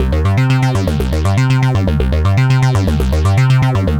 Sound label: Arpeggio Bass, Prototechno